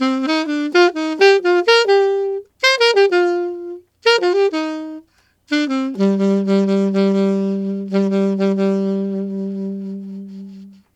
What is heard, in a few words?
Alto Jazz Waltz in C min, Sax; Sonic Foundry (Sony Creative Software)